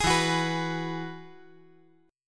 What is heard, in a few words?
E Major Add 9th